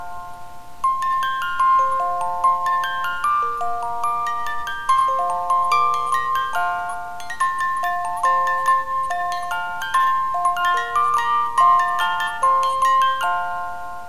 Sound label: Jingle Bells, Music Box